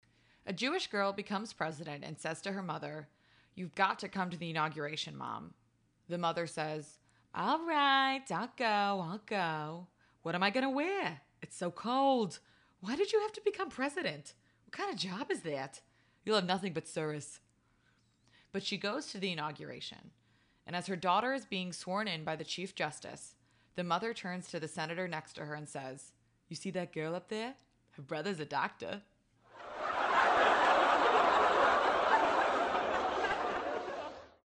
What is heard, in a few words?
Laugh; Joke